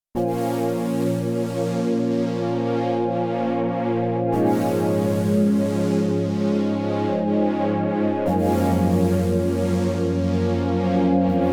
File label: Sync Pad, Korg Radias-R